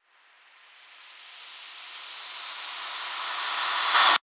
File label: FX Uplifter